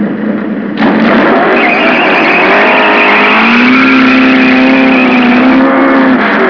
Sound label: motorcycle sounds